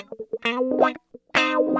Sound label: 133 Dance El Guitar Wah F; Dance DJ